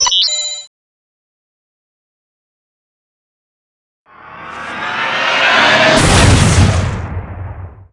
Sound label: checkpoint survival; poke646